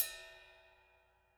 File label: Ride, Drumkits